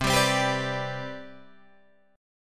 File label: C Major 7th Suspended 2nd C down Guitar Drop D, chord